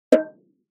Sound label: bongo